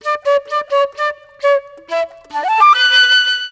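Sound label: flute toot, Musical Instruments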